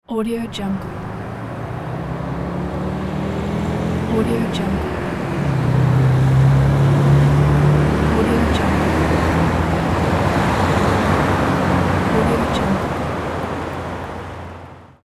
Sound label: evening street